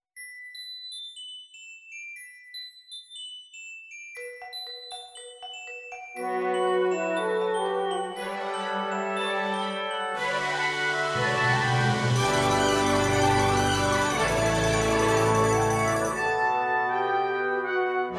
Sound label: Arise fanfare